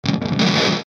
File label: Musical Distortion, Musical Distortion Static Short Rhythmic Pulse; Musical Sound Design; Blastwave